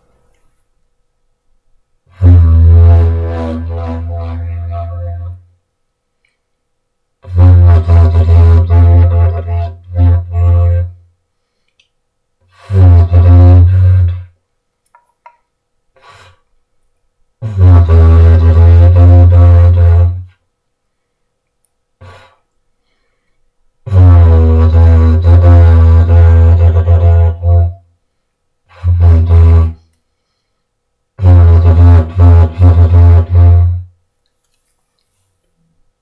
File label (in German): Didge Versuche zum Totlachen